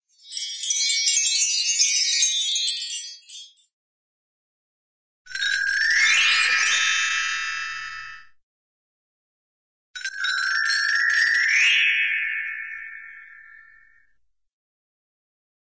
magic tinkerbell by; cartoon

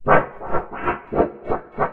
foley wobble; player

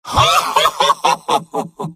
laugh happy; medic; video games; source engine; tf; mvm

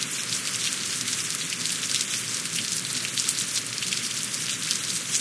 surface rain light loop; video game; Left 4 Dead 2; Source Engine; ambient; rainscapes

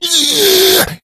rage, infected, male; npc; Left 4 Dead 2; Source Engine

